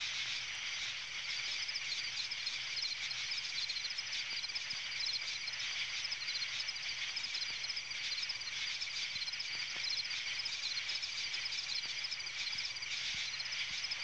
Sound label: Insects In A Swamp; SynTex